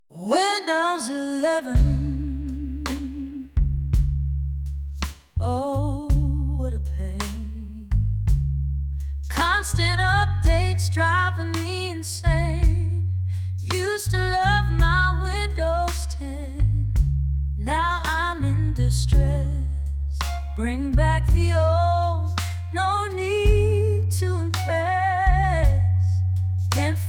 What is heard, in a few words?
Windows Blues